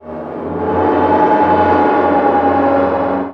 wall move, machines

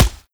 mud, bullet impacts; physics; Half Life Model Pack

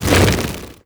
buzz, antlion wings

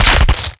spark short

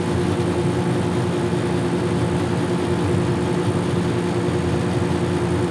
fan, ambient; nuke

